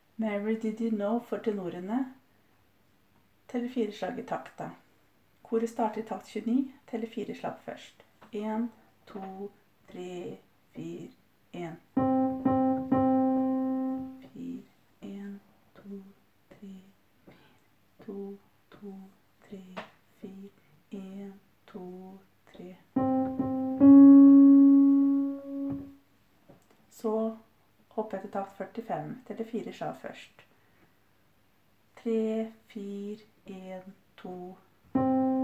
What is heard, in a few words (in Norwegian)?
Tenorene